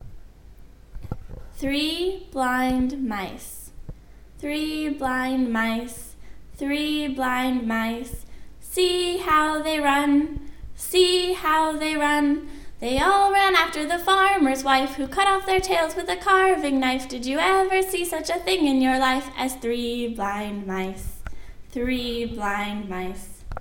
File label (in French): three blind mice CHANTE